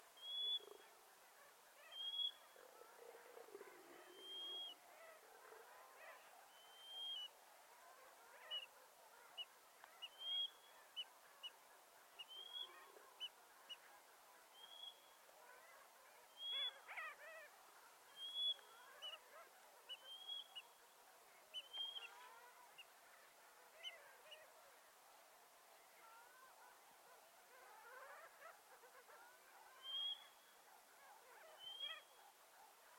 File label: black storm petrel